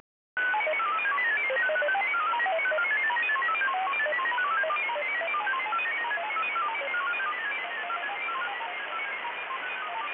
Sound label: MFSK